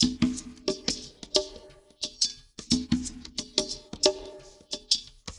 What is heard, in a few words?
LQPERC; Spectrasonics; Liquid Grooves 1 & 2